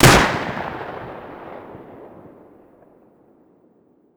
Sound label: extra gunshot; Rust